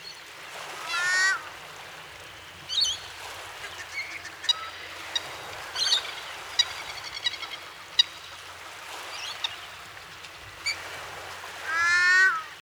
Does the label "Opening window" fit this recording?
No